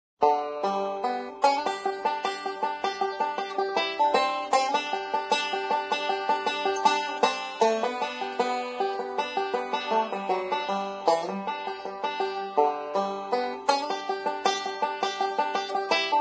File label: Banjo; Zomboid; Lifestyle